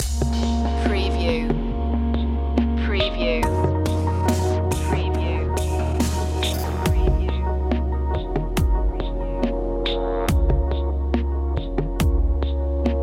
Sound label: oceanic loop